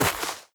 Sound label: step light, footsteps player grass